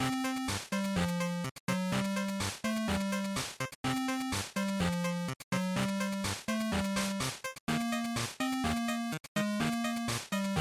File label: GB Fighting Game Over Loop125